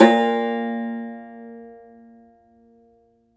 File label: Cumbus SAZ A#, Plucked Strings; E-MU Producer Series Vol. 7 – Old World Instruments